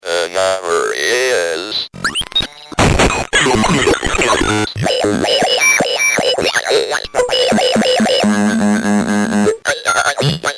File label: glitch; glitchswitch